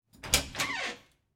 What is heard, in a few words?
Door Opening